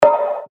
Clean, percussion; revealed percussion vol 2

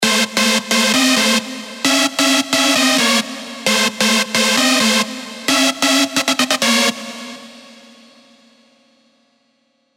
Stab, Serum2; Brainworm; alonso 18 year anniversary pack

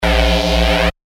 Bass Shots A#; Blast; revealed bass shots vol 4

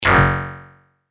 PERC Duct, percussion; revealed percussion vol 2

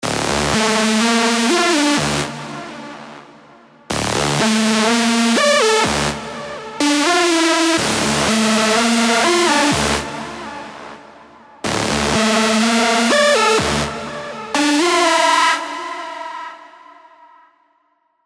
Rave Trancedance, melodic leads; revealed serum 2 melodic leads vol 1

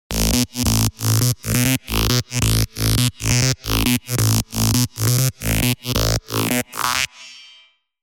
Poltergeist, serum 2 rolling bass; Revealed Serum 2 Rolling Bass Vol. 1

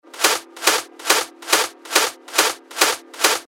140 BPM, techno loops; Antwerp; revealed techno top loops vol 8

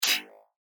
FX Hat, cymbals; revealed cymbals vol 1

